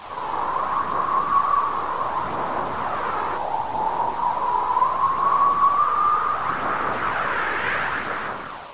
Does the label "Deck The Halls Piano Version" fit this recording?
No